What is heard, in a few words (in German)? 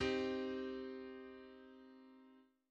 Moll Akkorde; Moll Akkorde aufbauen - Akkorde und Harmonie